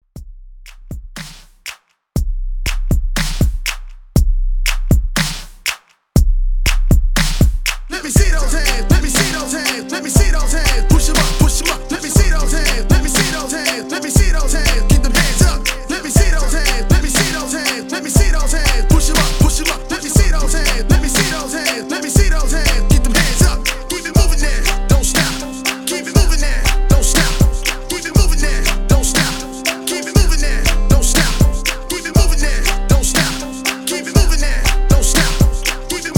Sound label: Country Hype 60 BPM; REDRUM